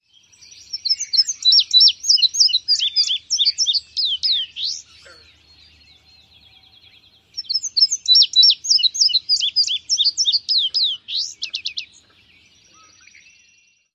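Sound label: Indigo Bunting, song number 2; NY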